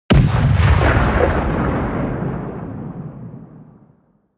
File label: artillery heavy distant